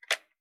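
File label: gear rattle weapon medium; viper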